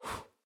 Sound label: candle blowing